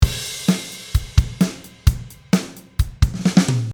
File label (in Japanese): drumフィル